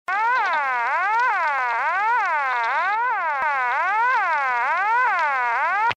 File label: intercept tone